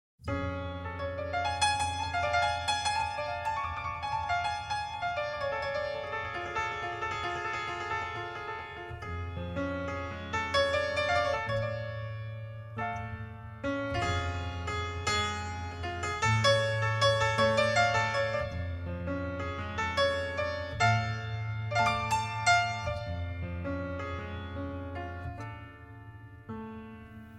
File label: Desert Blues World; World Music